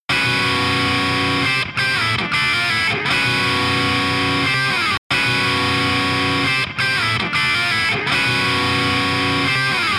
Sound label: Unknown Maybe a Marshall 2B